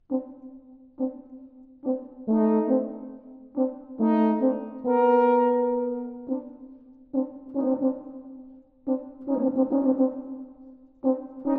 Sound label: For Horn And Tuba, Scherzo